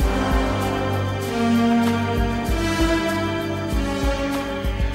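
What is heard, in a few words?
horns A major 97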